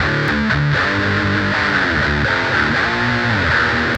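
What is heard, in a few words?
Guitar (metal); misc; Sonic Foundry (Sony Creative Software); Ugly Remnants VOL-2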